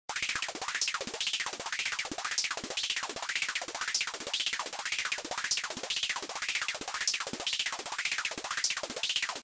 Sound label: nervous arp; opxpro